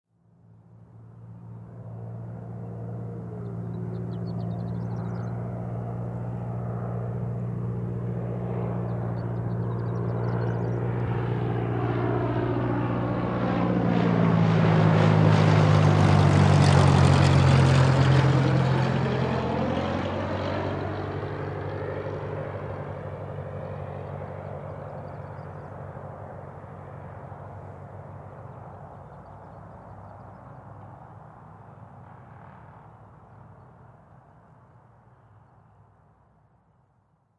Airplane Prop Plane Fly By, A propeller aircraft fly by; Good as a world war 2 aeroplane sound